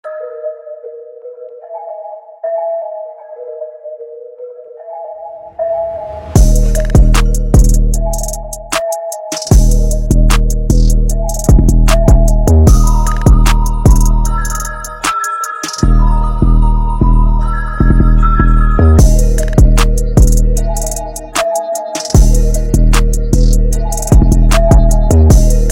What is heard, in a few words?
Trap Melodies 808; Cymatics